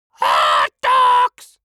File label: creature dwarf scream hot dogs